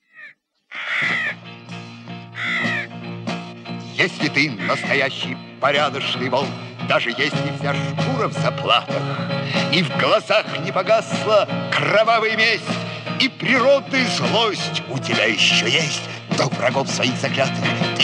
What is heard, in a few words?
Wolf song; Red Hat